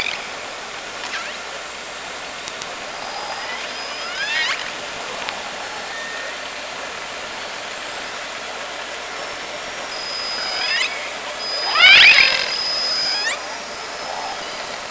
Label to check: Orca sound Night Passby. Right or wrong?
Right